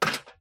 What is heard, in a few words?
stomp